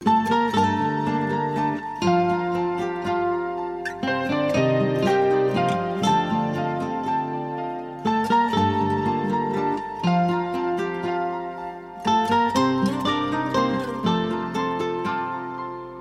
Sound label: new romantic ringtone